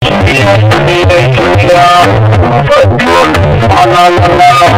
bass boost slow

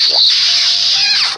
kissing

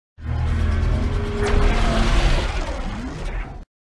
turbo punch; kaiju universe mechagodzilla

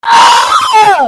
loud kid

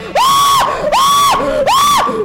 long scream

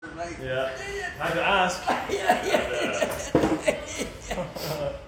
dying laughter

